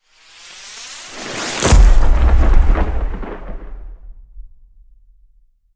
Explosion

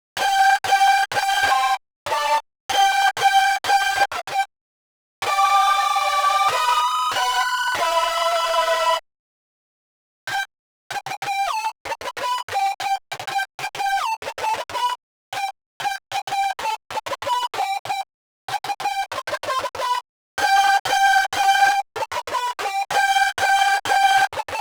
lead

